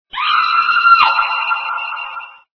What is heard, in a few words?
scream